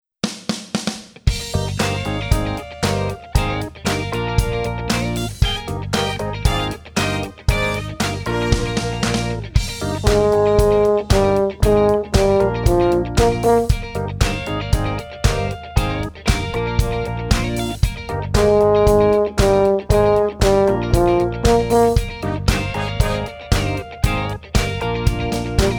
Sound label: Horn in F; Celebration